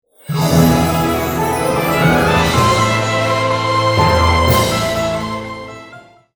Love Story Epic Romantic Sting

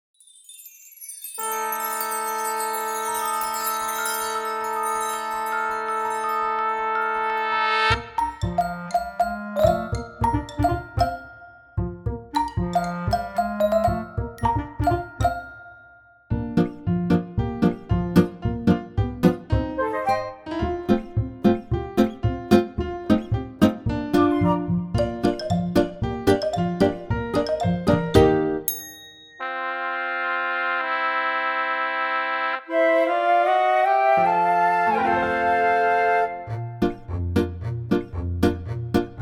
backing track; Mary Poppins; London Cast Recording

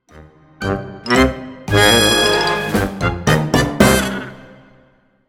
creepy walk, pantomime backing tracks

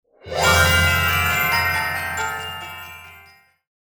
Bells; Dick Whittington

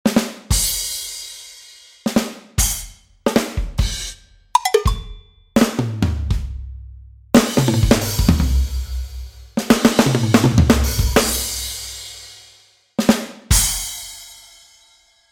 drum MKII

